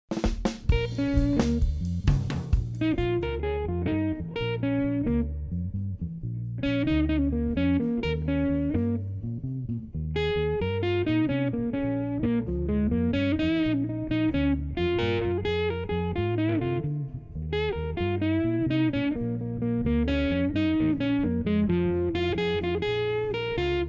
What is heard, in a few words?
Rhumba play along